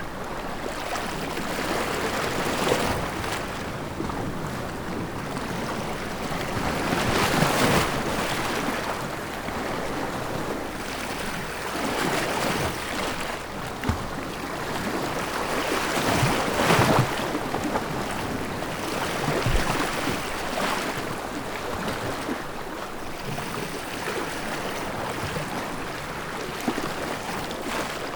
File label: waves